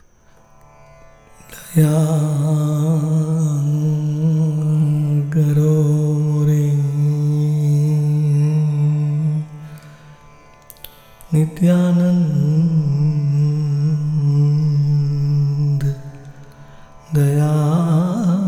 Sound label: Bengali Hindi Bhajan; Doya Karo Nitai